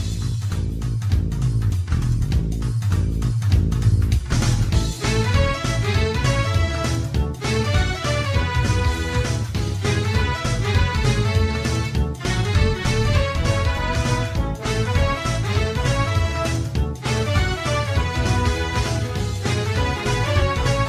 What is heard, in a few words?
congratulations